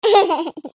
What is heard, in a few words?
laughter short